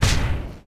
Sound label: Fireball; game audio; EnemyShooter; MiSide